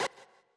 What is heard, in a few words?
Throw Monster Gauge